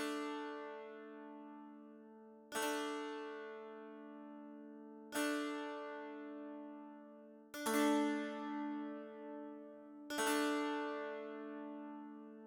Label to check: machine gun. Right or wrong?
Wrong